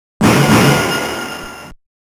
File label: Insert Coin; TAITO SOUND BULLETS